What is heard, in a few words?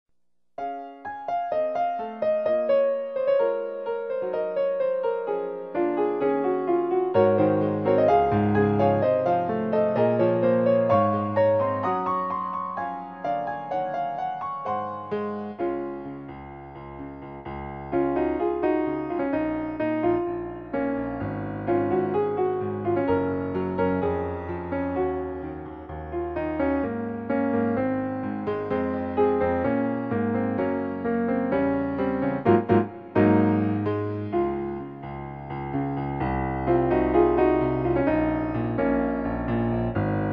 piano